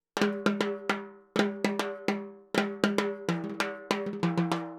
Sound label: Timbali, Latin Percussion